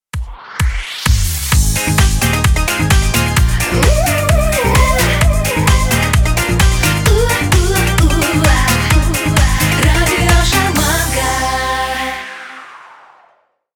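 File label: barrel organ; Sharmanka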